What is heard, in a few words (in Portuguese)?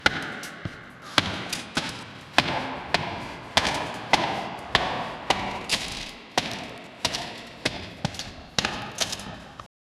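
passos lentos e bem pesados, tunnel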